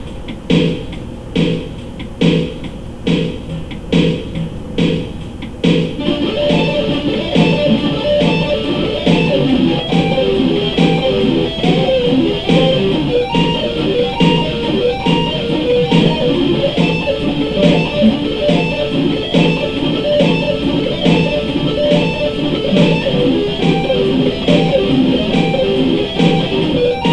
arpeggios

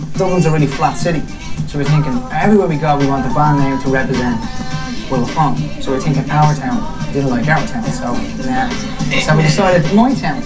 band

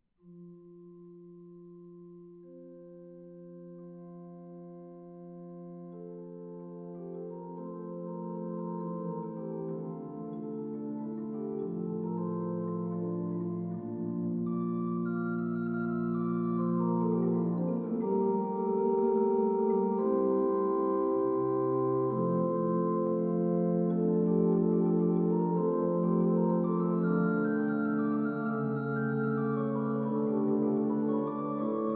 chant organ

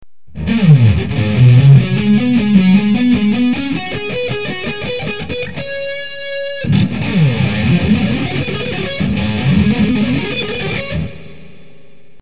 3 note pentatonic lick

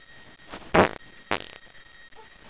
fart

